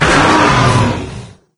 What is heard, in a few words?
attack solo, wraith; doom3